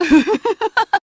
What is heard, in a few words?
laugh